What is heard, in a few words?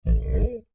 Creature Large Moan Intrigued; Monsters; Horror; BigRoomSound